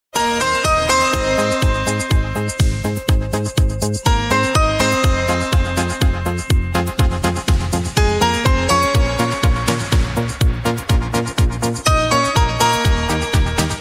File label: 80's style